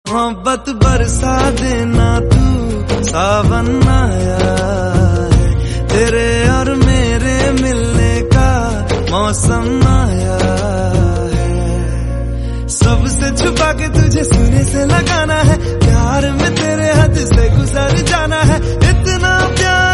Hindi Song Tone

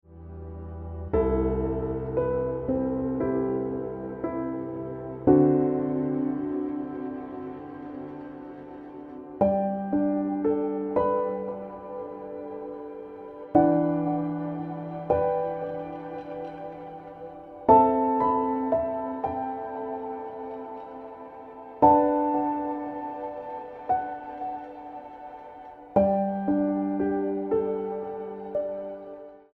Piano Music

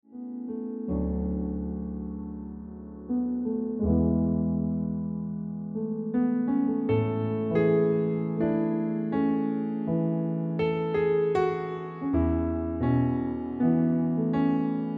Ringtone Piano Music

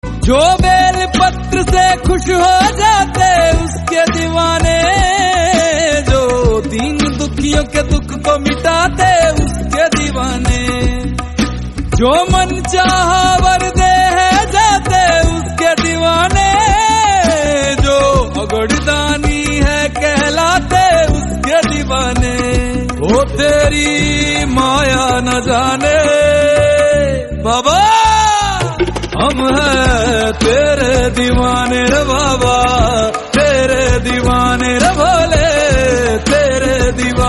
Hindi Bhakti Song

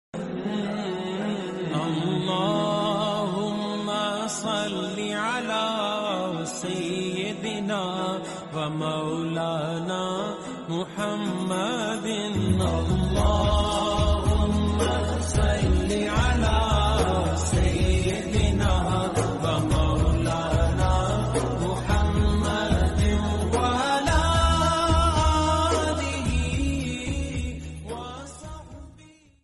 Arabic Islamic

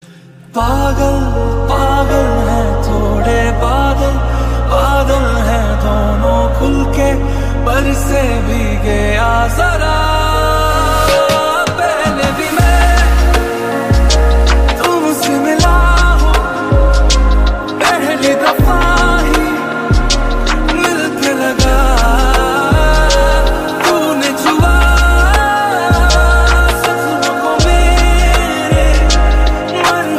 Slowed Reverb Lofi

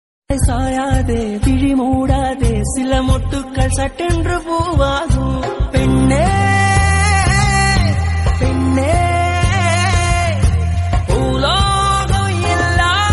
Song

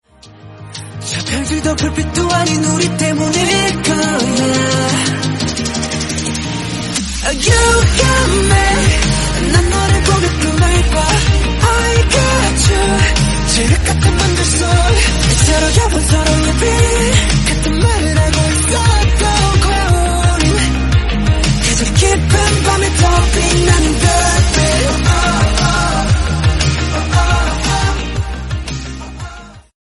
Korean Song

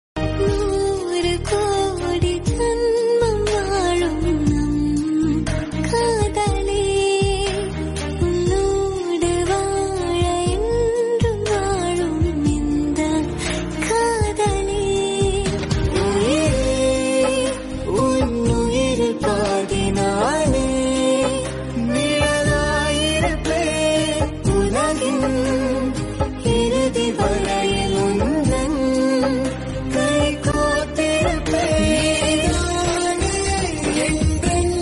Tv Serial Tamil; Nooru Kodi Jenmam Valum

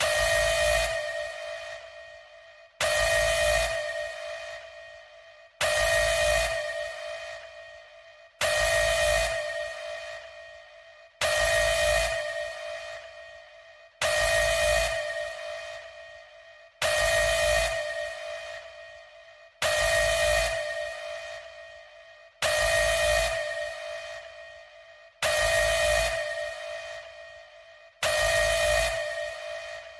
Malaysian Eas Sirens

